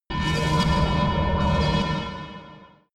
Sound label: space alien machinery working